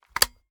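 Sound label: selector on, weapons foley; gmod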